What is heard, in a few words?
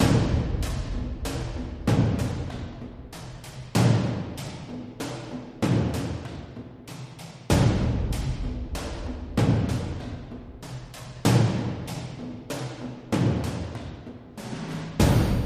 Action War Drums